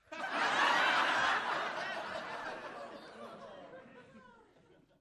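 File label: Crowd 50 Single Hearty, small interior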